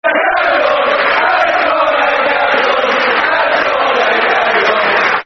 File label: Football Chant; Anton And Gabbidon; West Ham WHFC; fanchants